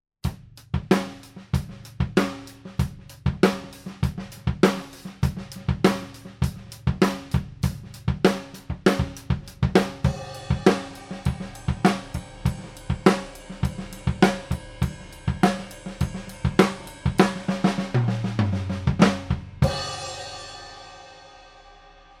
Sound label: beat